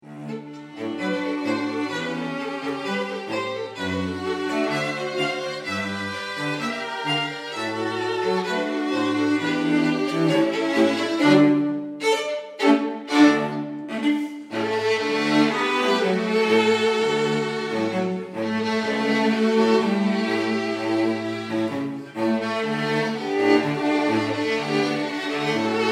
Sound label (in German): Quartett Tango; rossini quartett